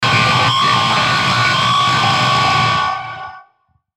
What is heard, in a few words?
Feedback Guitar Distortion Wah; Feedback Sound Effects